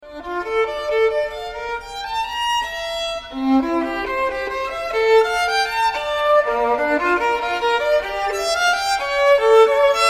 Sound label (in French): partita n2 pour violon seul, chaconne; BACH